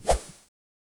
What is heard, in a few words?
movement